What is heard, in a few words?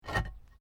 PAVING STONE MOVE, dragging